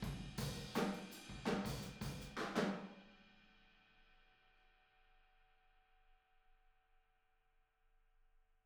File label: Boogaloo - Outro - Room ST, Drums, 82 BPM